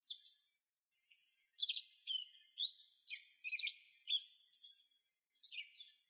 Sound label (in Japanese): aoji saezuri